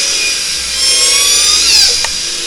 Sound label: call S 1 long Lots Noise